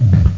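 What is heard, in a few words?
HEART BEAT; MacAddict; Carmageddon Demo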